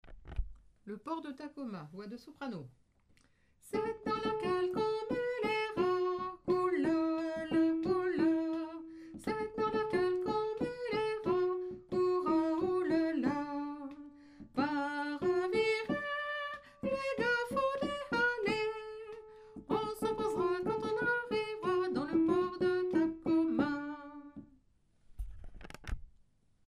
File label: soprano